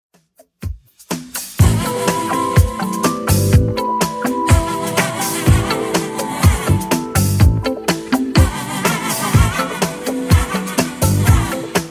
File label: 80s Redrum 124bpm